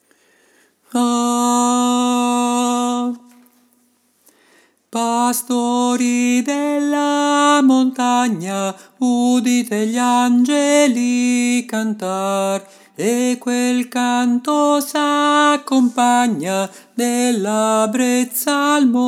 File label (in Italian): angeli nelle campagne soprani